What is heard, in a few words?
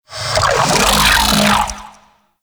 Sentient Vocal Attack